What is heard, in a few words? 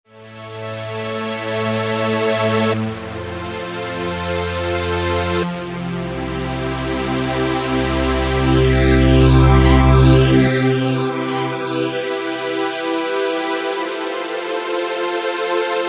Big Str Pad, Roland V-Synth; Factory Patches